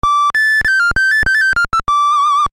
doot; Carpathian Night demo 2019 Halloween

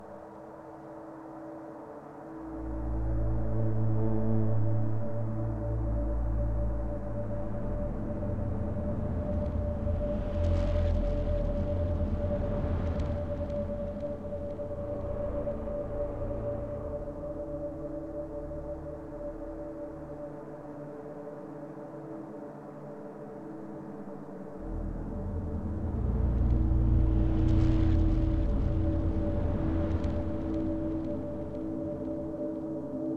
drone; dead rising 3 apocalypse edition 2014 pc gamerip

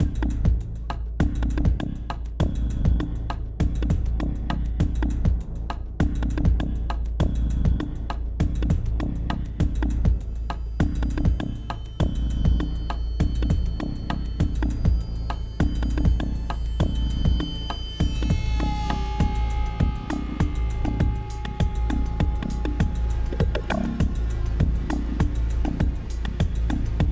Music Gameplay State Tension; Hideout1

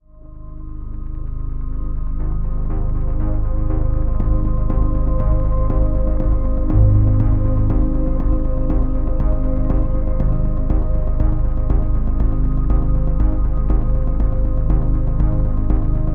music loop

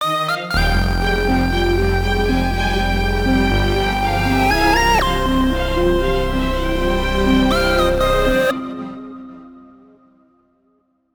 boss section a head 120bpm 17beats

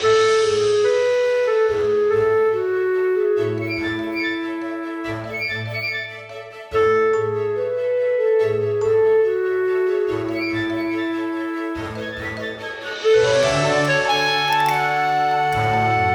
music world enchanted forest

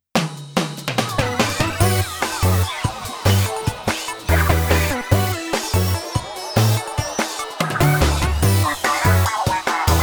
Defeat; Splatoween, Phase 1

